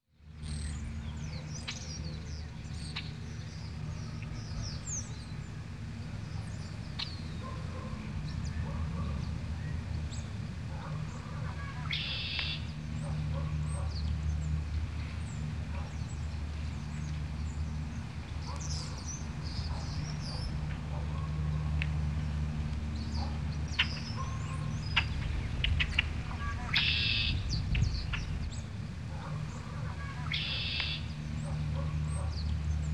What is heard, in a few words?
birds Dogs; animals